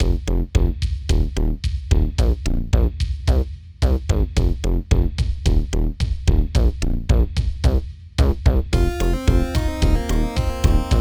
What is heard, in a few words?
meteor fight